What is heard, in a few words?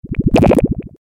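liquid bubbling short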